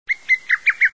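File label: lesser cuckoo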